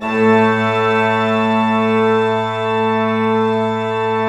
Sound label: PLENO A2, Cathedral Organ, PEDAL PLENO; Propeller Island